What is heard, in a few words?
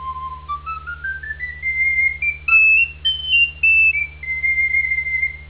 recorder garklein; sampler